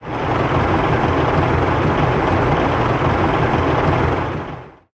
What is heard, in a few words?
Removable Dozer Loop